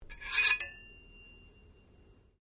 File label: Blade Scrape Contact Fast Ring, Sword